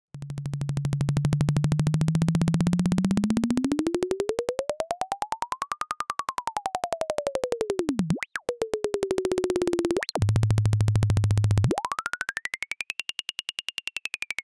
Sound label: high resonance